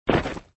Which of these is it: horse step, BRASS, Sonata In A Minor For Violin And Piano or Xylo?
horse step